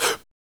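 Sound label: vox percussion, Vocal Planet Jazz FX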